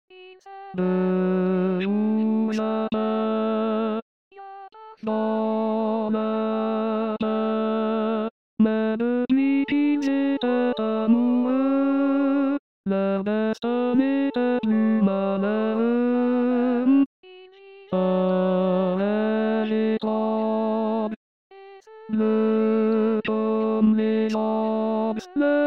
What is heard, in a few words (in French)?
hommes